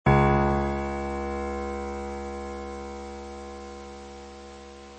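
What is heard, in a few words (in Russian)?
пианино одиночный низкий шумный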